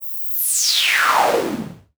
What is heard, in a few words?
Swept Noise